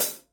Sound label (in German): Paiste 13 PST 3 Hi Hat Becken